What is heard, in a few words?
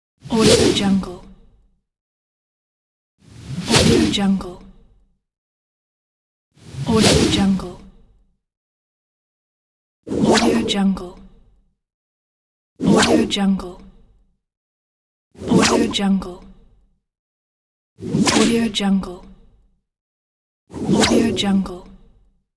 whoosh sound effects pack